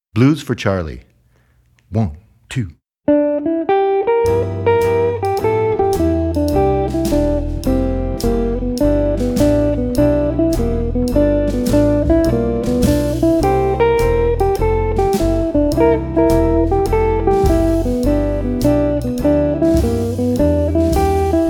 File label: Blues